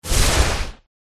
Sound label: Fire Spirit Block